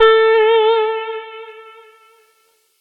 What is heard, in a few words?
Lead Synth